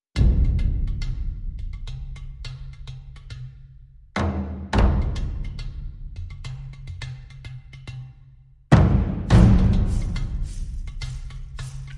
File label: Perc Only